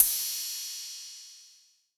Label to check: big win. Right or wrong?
Wrong